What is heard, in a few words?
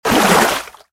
gather splash; Ultimate Fishing Simulator 3 Demo